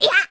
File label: Run; Killer Bee #3 running